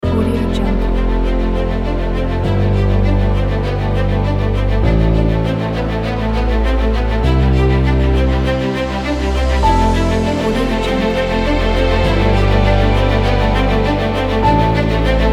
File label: epic hope